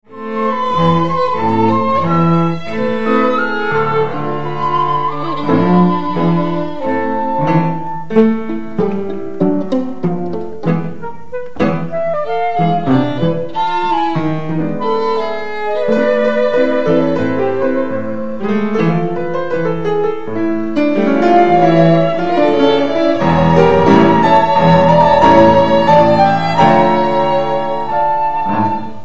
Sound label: tango